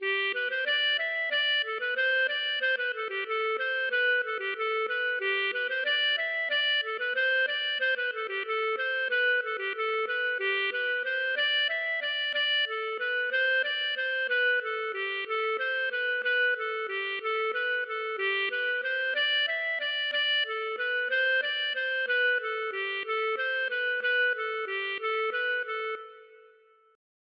scottish Valse

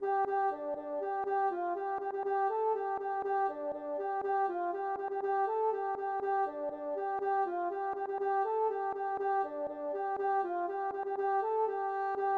rond

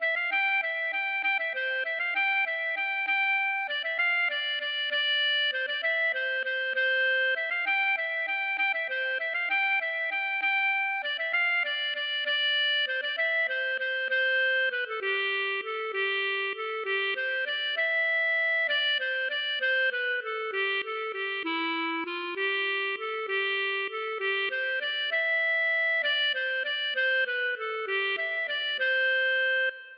bourree; Grandes Poteries

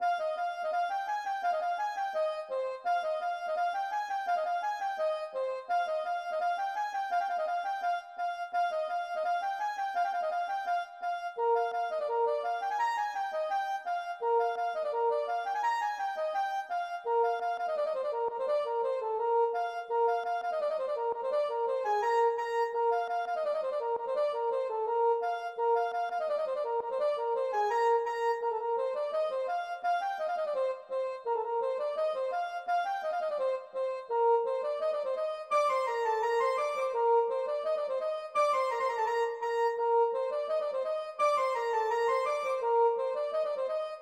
gavotte; Gavotte pourlet No4

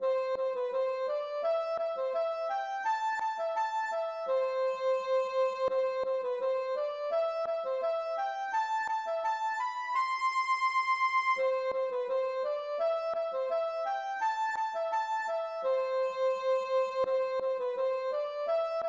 polka Syncope

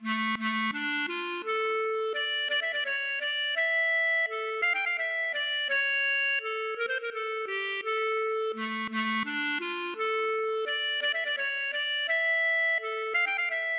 valse

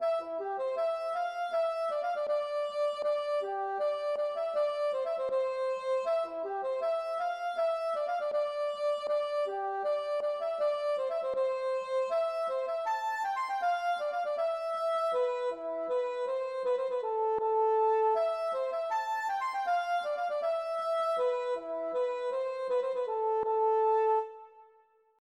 polka Finlandaise

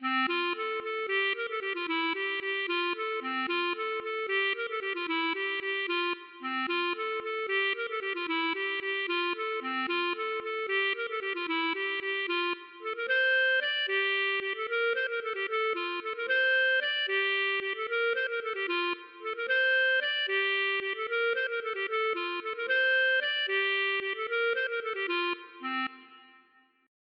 bourree; De Royat

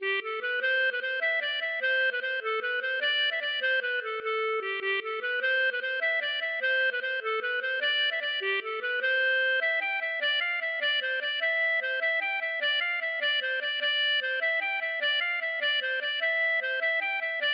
bourree

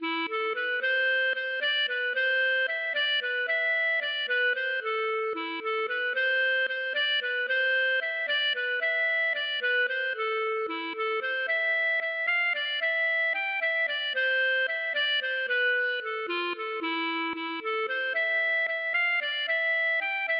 valse 5 temps

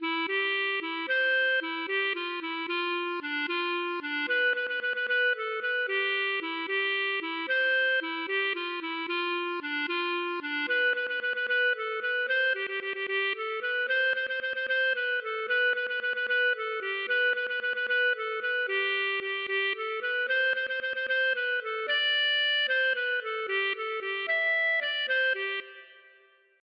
valse

